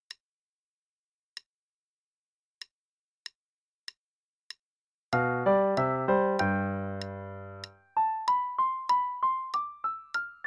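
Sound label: Play-a-long, Sonatina in C Major, quarter note=96; Latour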